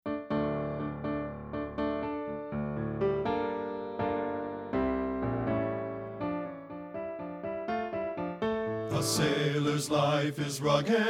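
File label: bass 2, men, choral; A SAILORS LIFE; partbypart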